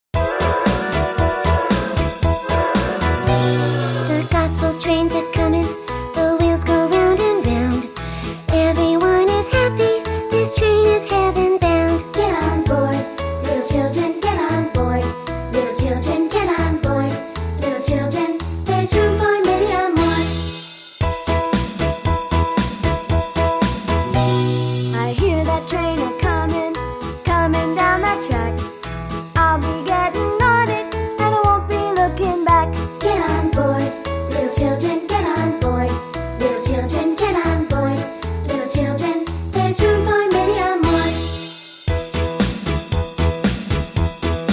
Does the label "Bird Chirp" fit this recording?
No